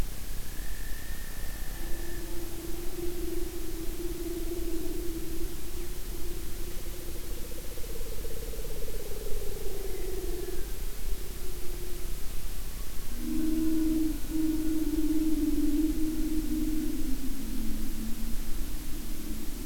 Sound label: Klang des Ozeans leopard seal calls